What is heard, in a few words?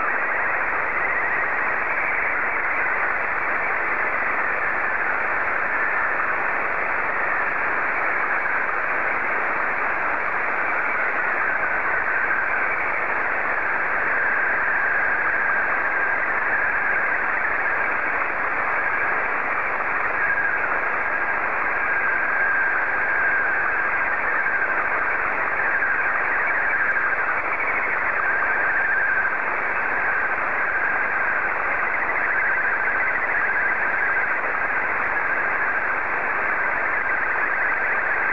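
SSTV, Martin 2; VK4MSL SSTV RX @ QG62ln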